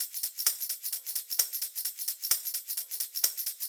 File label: Live Percussion, 130 BPM; Sonic Foundry (Sony Creative Software); Underground UK House & Electro